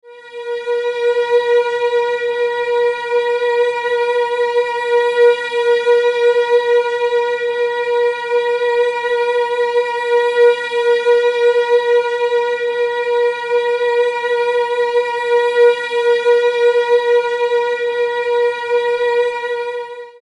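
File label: High A sharp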